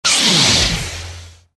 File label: enemy saber on; effets speciaux